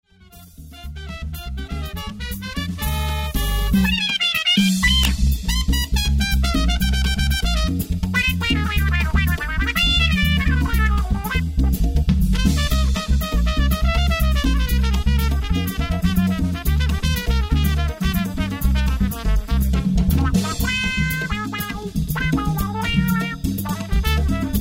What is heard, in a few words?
Trumpet Solo; Knight Music